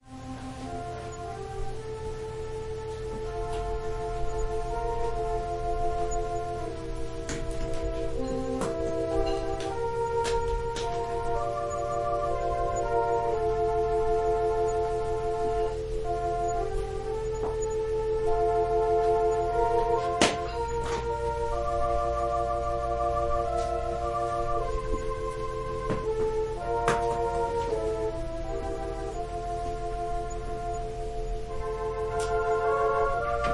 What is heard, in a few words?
Organ prelude